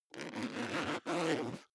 zipper backpack open unstable

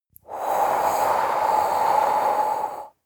breath female exhale blow heavy

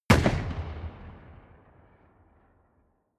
shoot distant

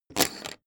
poker chip stack big fall down

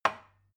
glass put down cupboard soft

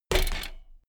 bmx bike land impact rattle

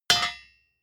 hammer hit steel clank

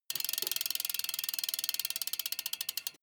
bmx bike freewheel push and slow down short

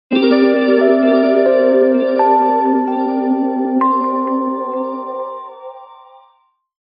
heavenly

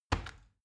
plastic button tiny click heavy fast

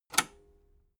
metal lever button radio disable fast

